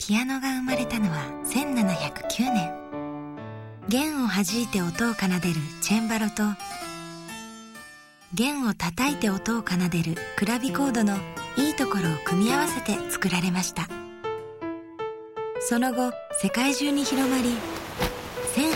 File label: piano